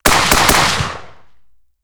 IMI Tavor TAR-21 5.56mm left off axis Triple shots; 1m; MKH416